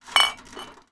Cups; TR3; Playable Tutorial Level

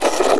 Portcullis Up; Playable Tutorial Level

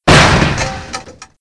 Smash metal; Playable Tutorial Level

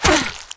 Death Spikes; Lara; Playable Tutorial Level

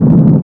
Low Rumble; Playable Tutorial Level

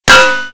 Hit Metal; Sword God; Playable Tutorial Level

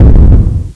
Giant Mutant Hit Ground; Playable Tutorial Level; TR1

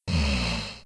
Guard Snore Exhale; Playable Tutorial Level

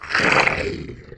Lizard Man Climb; Playable Tutorial Level; TR3

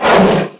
Bird Monster Gasp; Playable Tutorial Level; TR2

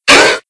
Hydra Attack; Playable Tutorial Level

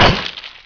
Monk Takehit; Playable Tutorial Level; TR2

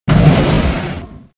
Drawers Wood Open; Playable Tutorial Level

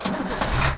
Vehicle Jeep Start; Playable Tutorial Level

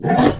Claw Mutant Attack; Playable Tutorial Level; TR3

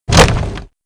Barrel Drop; Playable Tutorial Level

